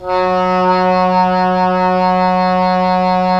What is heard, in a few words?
Cor Oboe; Roland - LA Composer vol. 4